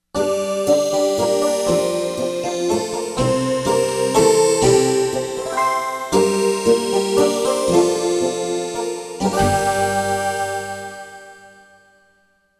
Warm Sant; Korg MSC-13S Ethnic 2

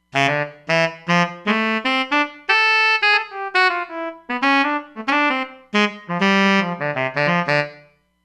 Tenor Sax, synth

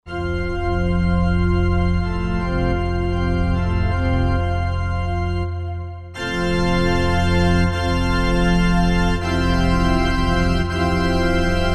Cathedral; Roland JV-2080

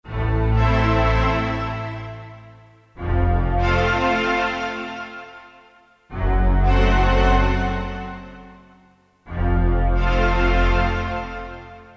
Legato, Korg DSS-1 Strings

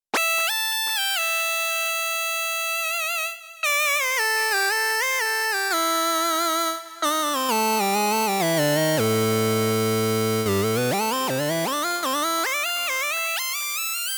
OSC Lead, Korg Radias-R

